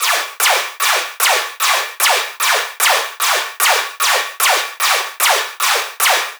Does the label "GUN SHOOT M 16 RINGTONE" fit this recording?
No